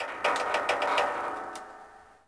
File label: creak metal; Star Wars Obi Wan; XBOX